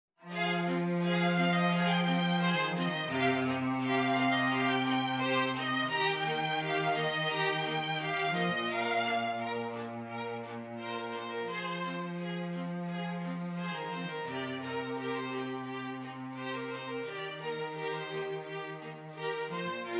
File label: STRING QUARTET